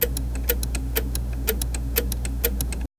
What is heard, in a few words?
slow clicking mechanical, clockwork